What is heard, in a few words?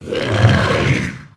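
attack; sinfar haks; sf effects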